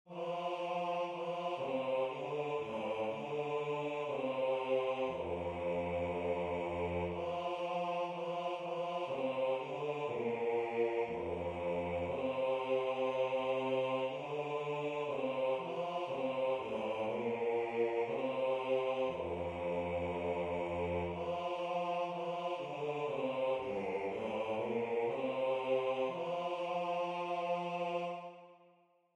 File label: Bass